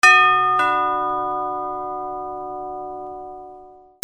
HOUSE

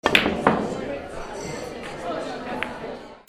BILLIARD

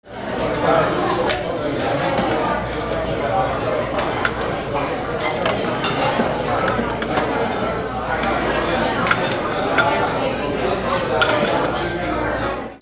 AMBIENCE

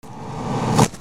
RIPPING

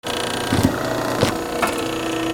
INDUSTRY

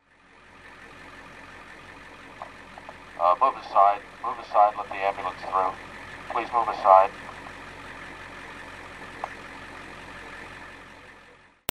VEHICLES

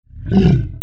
CARTOON

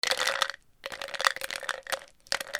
BATHROOM

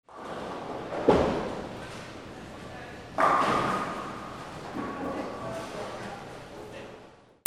GAMES

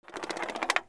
BOATS

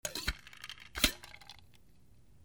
KITCHEN

